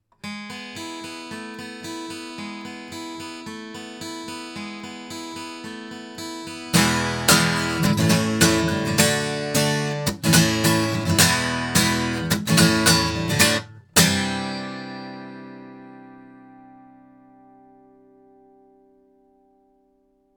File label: Violet Finger guitar, microphones